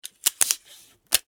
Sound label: Office Scotch Tape Dispenser